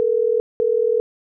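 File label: ringback tone Hong Kong; iOS 26 Sounds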